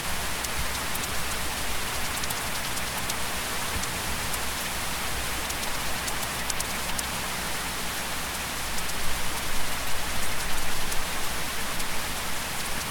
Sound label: Rainforest